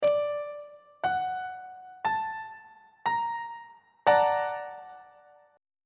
b flat augmented major 7th 1st inversion on treble clef